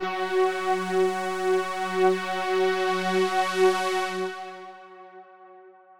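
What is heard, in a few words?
analog F#4; analog server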